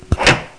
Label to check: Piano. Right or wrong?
Wrong